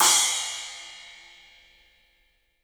CYMBALS; 3D Sound; 3D Drums

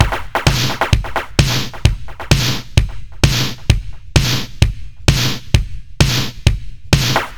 Beat Katana; Zero-G; Future Wave; Beats

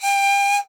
PIPE G 5, REED PIPE; Africa; Spectrasonics; Heart of Africa 1

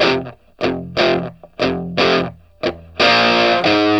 Vintage Blues Guitar, 60, F; USB Soundscan vol.22; AKAI

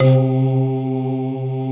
SYN K-2000, Roland

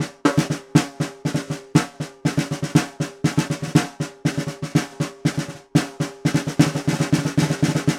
Vintage Ludwig Snare, Percussion; Appalachian; Continental Drift Aftershocks

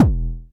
BD, Bassdrums, Roland TD-20

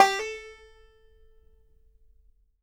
BANJO NOTES, A 4; Sampleheads - Whole Lotta Country 1 & 2

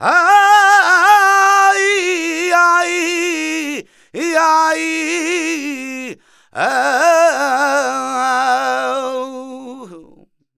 voc c, Flamenco Vocals Male Cantaor

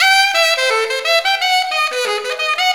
Sax Jazz Riff; Sonic Foundry (Sony Creative Software); Knutrix Drum & Bass

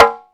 bata; AKAI S-Series CD-ROM Sound Library VOL-5

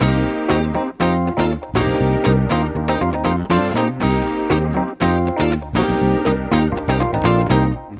Backing, Nu Disco, 120bpm Cm; Zero-G; Construction Kits; Legacy

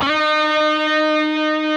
PRS E, Guitars, LEAD; Spectrasonics; Hans Zimmer Guitars VOL-1

